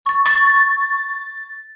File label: phone notification